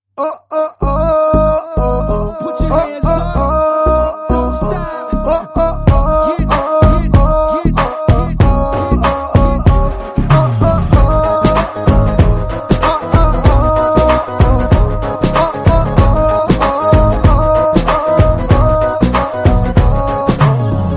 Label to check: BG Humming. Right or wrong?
Right